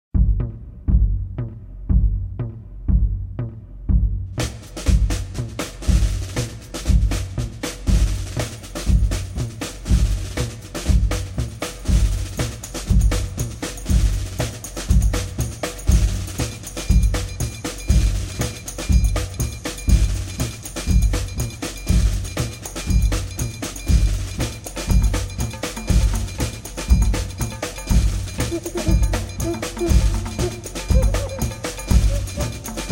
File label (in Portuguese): marcha de carnaval